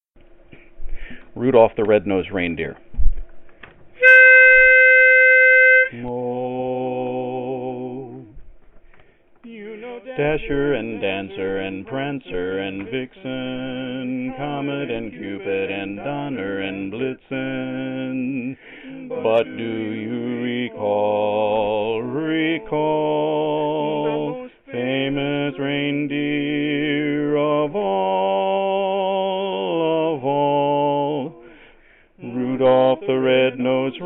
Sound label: Bass; Christmas